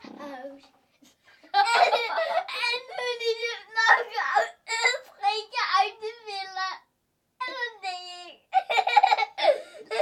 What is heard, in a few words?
A Fart And Fun Boy And Girl